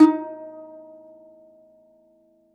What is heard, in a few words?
DOMRA, Segundo Domra, Plucked Strings